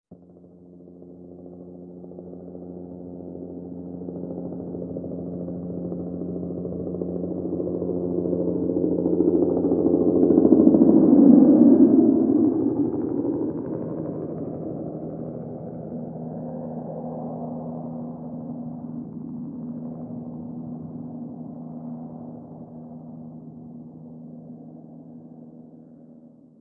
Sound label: heli flyover int; amb elements; modern warfare 2